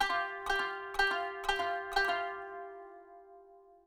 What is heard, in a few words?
kanun